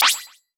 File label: mid air shine; HeavenStudioPlus; seeSaw